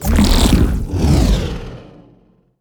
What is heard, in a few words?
Monster; Zenless Zone Zero